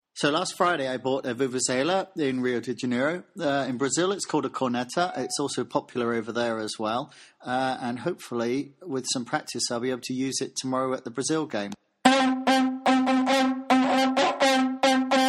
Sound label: vuvuzela practice; before watching brazil play north korea on tv from london